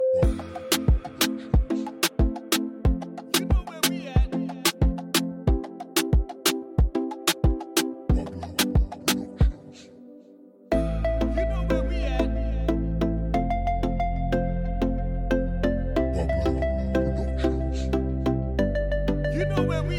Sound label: Latin Reggaeton